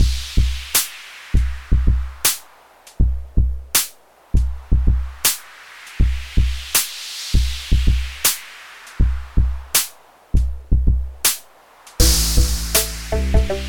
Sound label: DS-10 Within a Deep Forest looped; LPChip